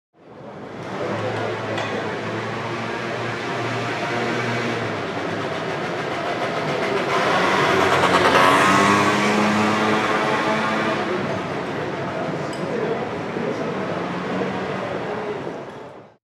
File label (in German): Mopet in Kolonaden